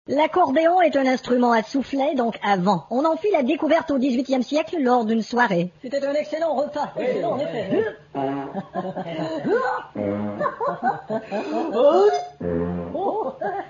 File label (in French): l'accordeon